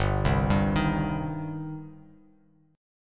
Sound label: G Suspended 4th Sharp 5th G down Bass Standard; Gsus4#5 arpeggiated; JGuitar